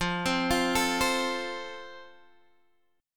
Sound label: F Major, Guitar Drop D